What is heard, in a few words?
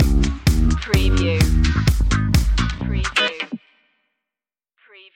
disco loopy stinger